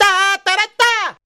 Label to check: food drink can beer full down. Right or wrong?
Wrong